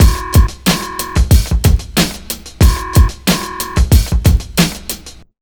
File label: 92 beat, Underground Hip Hop; USB Soundscan vol.02; AKAI